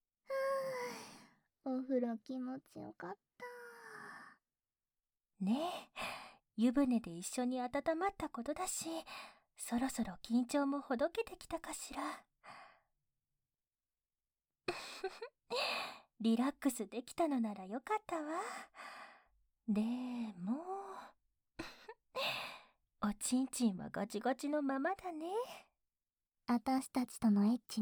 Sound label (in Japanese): 両耳舐めと乳首責めとWフェラ; 人気姉妹ソープ嬢